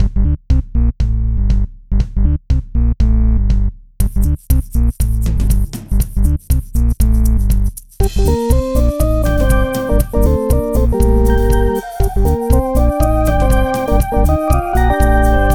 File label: 8 bit Inspired Game Song